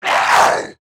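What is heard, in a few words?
attack, zombie male; nmr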